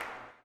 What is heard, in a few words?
CLAP, Blues Soul; Spectrasonics Vocal Planet